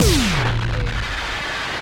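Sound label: Production Element Hit Distort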